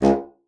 bassoon C#2; Xsample VOL-6

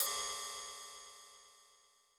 Roland U220 Dry Set

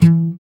GUI AC, Acoustic Slap, Roland; Sound & Vision; Gigapack I CD 2

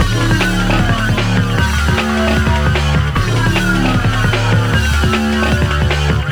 Gone Insane, Hardcore Breakbeat, 152 Dmin; Big Fish Audio; Hardcore Breakbeat Action

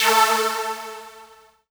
Synth Hit A

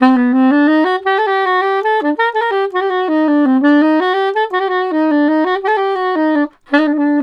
SAX, 132, Balkanish Traditions; USB Soundscan vol.63; AKAI

